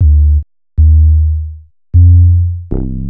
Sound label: Bass, Futurist Drum & Bass